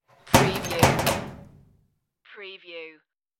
lock metal locker, the sound pack tree

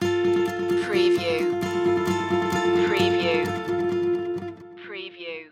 take my hand stinger

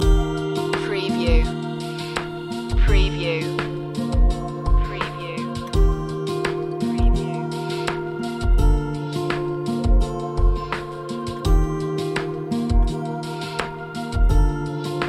acoustic escape loop

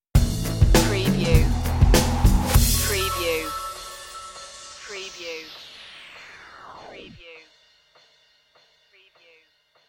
electron stinger

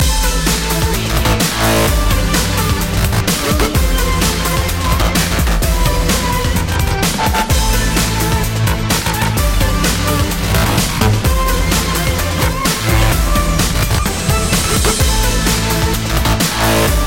dubstep loop

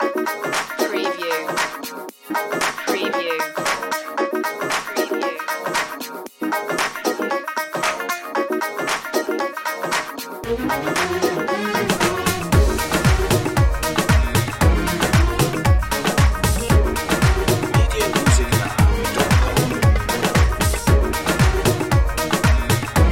arabian bounce

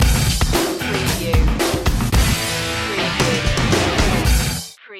battle beat

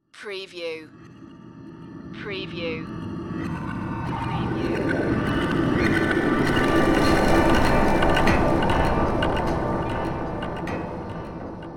rising doom

